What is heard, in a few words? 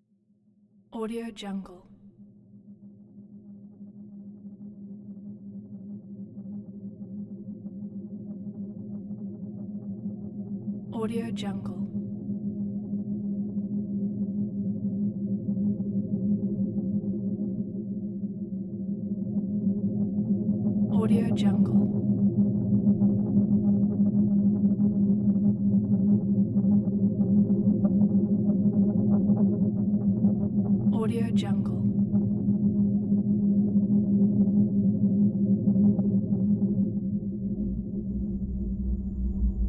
outer space low hum noise